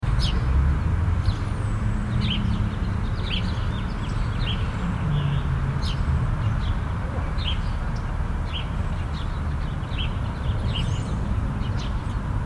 City Park Background Long, Birds singing, distant city traffic; Distant dog, sirens and jets; City Sound Effects